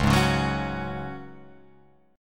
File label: Db Suspended 4th, Guitar, Open F; 1,3,1,1,4,1; strummed